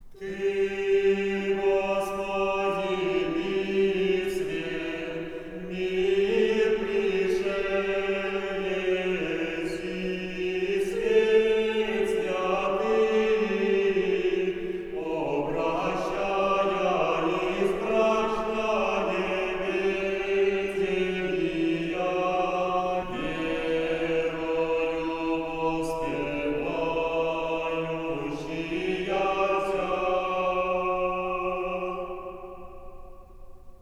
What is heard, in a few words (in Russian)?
Ирмос 5 песни на утрени 4 глас